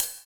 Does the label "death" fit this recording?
No